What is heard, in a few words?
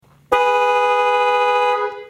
piaa automotive horns